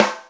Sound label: fever snare; HeavenStudio; charging Chicken